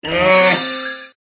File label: Pet Fairy Bear Die; teddy